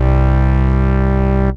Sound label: Dalmation Bass, Analog; Ableton; Factory Packs; Synth Essentials